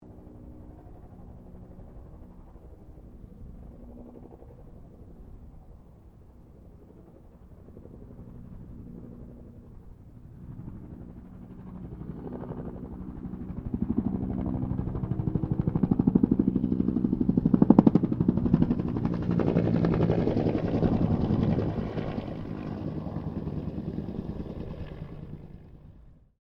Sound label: HELICOPTER 1967 BELL UH1 IROQUIOS PASS